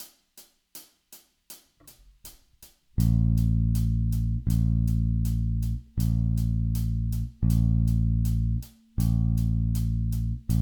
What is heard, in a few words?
blues rock, baixo